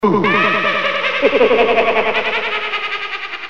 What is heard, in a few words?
Witch Laugh